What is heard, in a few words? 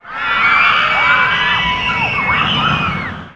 Scream